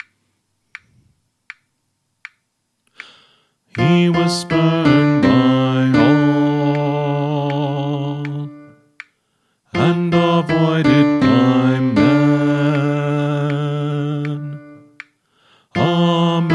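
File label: Song, Choir Practice Tracks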